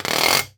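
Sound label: LARGE WASHBOARD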